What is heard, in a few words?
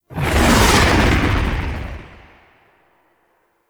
Blue Sky Liset Fly By Quick, vehicles; Warframe; Liset Flight